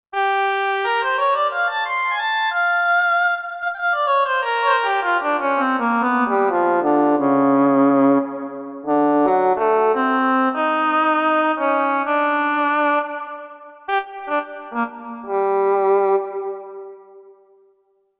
Double Reed, Korg MSC-1S; Korg MSC-1S Variety

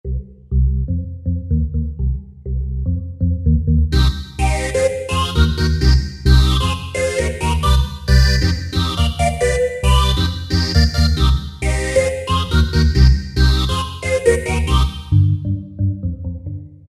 Generalmusic Equinox; Rave On

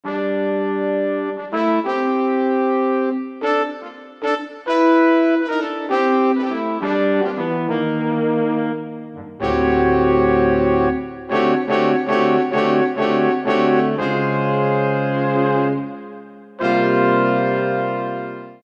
Harmony, Korg MSC-4S Orchestra; Korg MSC-4S Orchestra 1

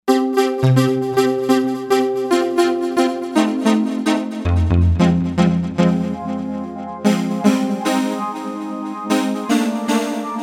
Wavetable Pluck, Novation UltraNova; Factory Patches

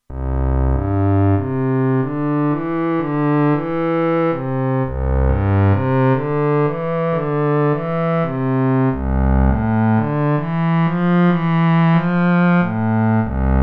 Cello, Roland Alpha Juno-2; Original Sound Chart patches

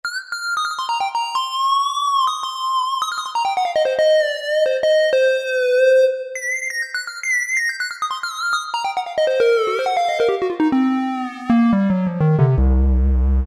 Mini, Yamaha TG33; Factory Presets; Preset bank 1

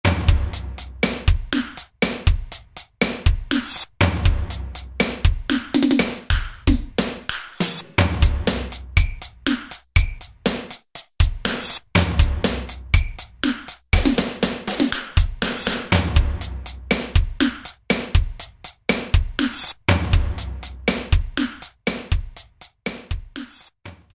Techno, Alesis SR-16; Preset Patterns

